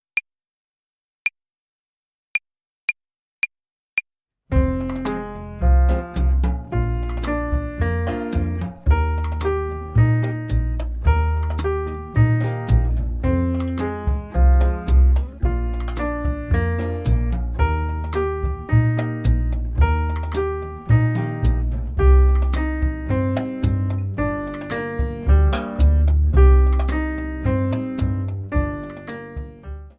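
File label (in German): Akkordzerlegungen Übung, Play along Slow, Klavier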